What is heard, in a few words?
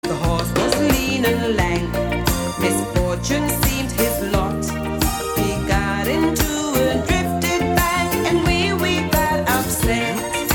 Jingle Bells